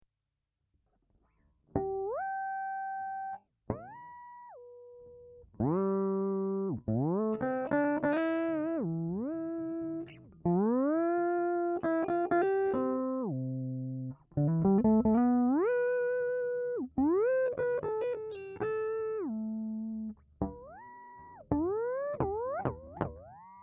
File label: whammy plus 1